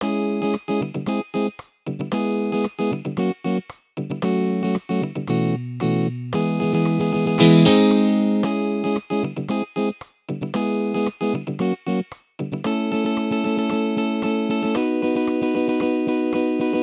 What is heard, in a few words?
FUNK Backing; MONACO